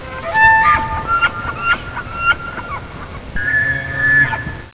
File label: elk